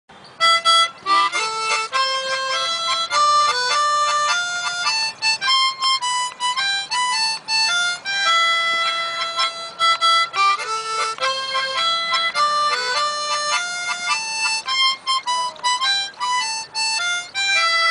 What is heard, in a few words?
Harmonica